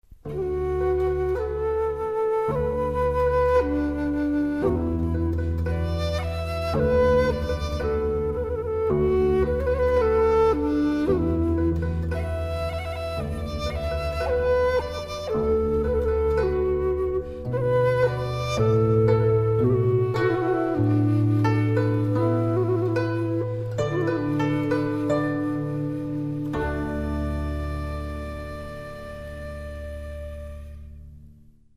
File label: bgm wedding